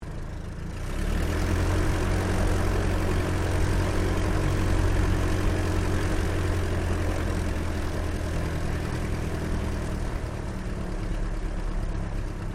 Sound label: AIRCRAFT DH82A DEHAVILAND TIGERMOTH TAXI GRASS INTERIOR; RAAF Tigermoth; Airplanes; Vehicles